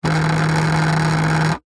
household mobile phone vibrate on plastic surface rattle